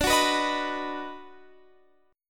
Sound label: Eb Minor Major 7th Sharp 5th D, chord